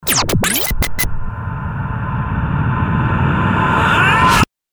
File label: Building Scream; Best Of N2O Library